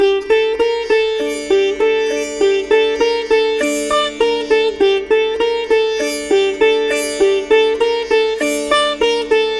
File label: Sitar D MIN 100; Indian sitar; Indian Sitar Vol. 2